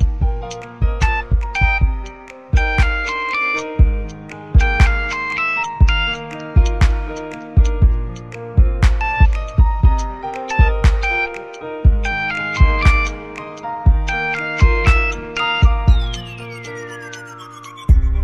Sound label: Samsung S10 sms Ringtone